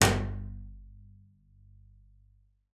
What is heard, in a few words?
G1, bass pizz; Xsample VOL-5